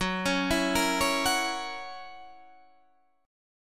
Gb 7th Gb, chord

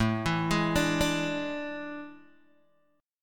A Major 7th Suspended 4th, Guitar, Open G

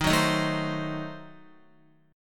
Eb Diminished 7th, Guitar; strummed; chordshape; JGuitar

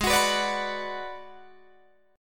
Ab Minor 7th Flat 5th Ab, down, Guitar, Drop D

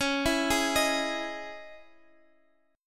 C sharp Minor Add 9th C sharp, chord; C#madd9; arpeggiated; JGuitar

